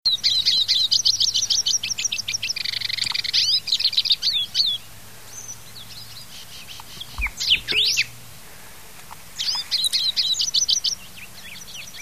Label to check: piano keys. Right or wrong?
Wrong